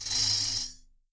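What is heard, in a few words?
shake, right, Percussion; Miroslav Vitous - Percussion & Harp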